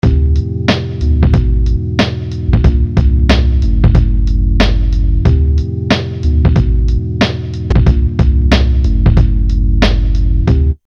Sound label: s900; sampler